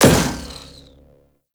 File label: Shot Fire, Weapons; Warframe; Cedo Prime